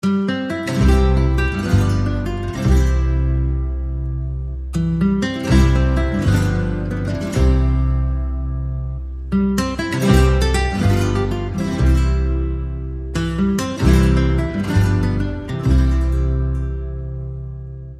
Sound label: No Strings